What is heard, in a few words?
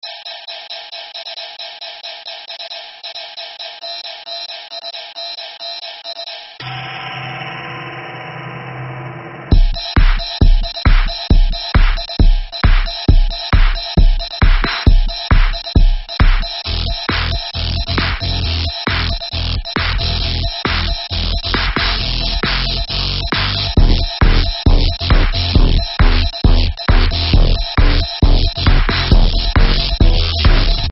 Glass Grind